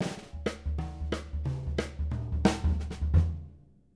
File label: SWING, Living Drums